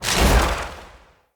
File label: Casting Device Cast Dual; Weapons; Player